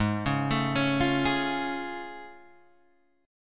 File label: A Augmented Major 7th Ab